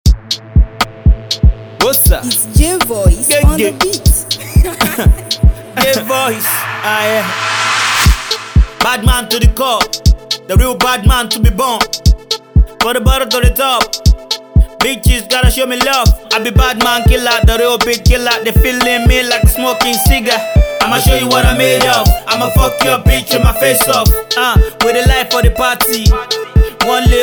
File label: voice bounce